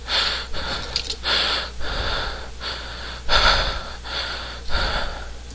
breathing loop, male

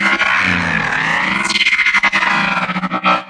transforming robot systems check

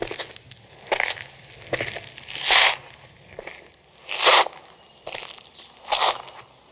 walking on gravel; Sound FX Real Life

